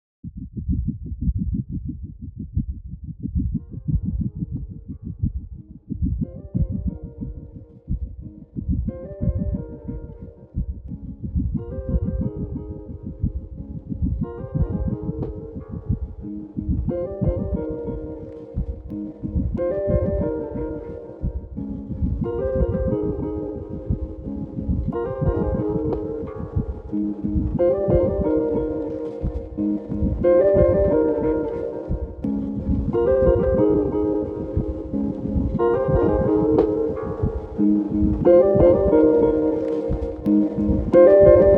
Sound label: whale song